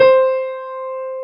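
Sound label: grand piano C4; AKAI S-Series CD-ROM Sound Library VOL-2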